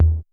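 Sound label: analog tom; 90 sSampleCDs; Crypton - DrumBase5000; ANALOG KITS2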